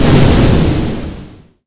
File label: Explosion; Rei Yumesaki